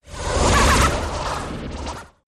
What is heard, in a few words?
Big Heavy Flutter, Taylor Grand